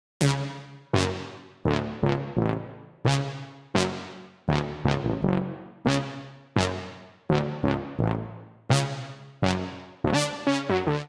Funk Lead F# Minor 85bpm; MIDI Clips; Melodic; Ableton; Factory Packs; Chop and Swing

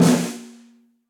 Snare Press; Snare Articulation; Drum Hits; DeepSnareDynamics; Session Drums Club; Standard Hits; Ableton

